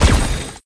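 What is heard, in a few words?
atk chain gun shot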